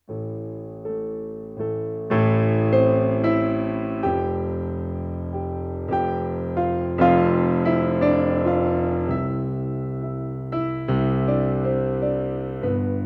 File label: Piano